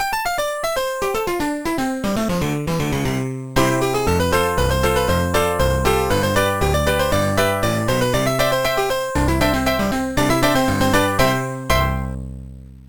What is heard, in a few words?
jingle ragtime; Trackers; Modland